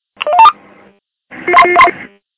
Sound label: tractor john deere